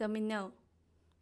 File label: EDUCATIONAL TOYS Domino